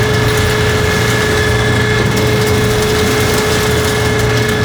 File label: Powerplant Jettison Door Loop; Gameplay; Warframe; Corpus Railjack; Tether Power Plant; Ambience